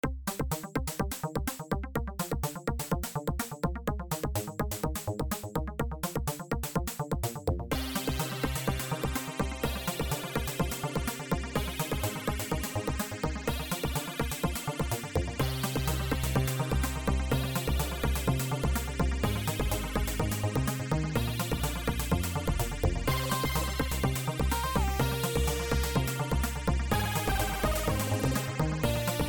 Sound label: turbo sound fm, rainstorm